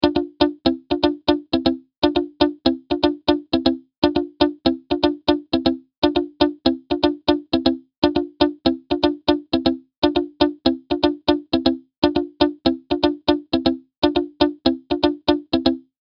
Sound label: Original Guiter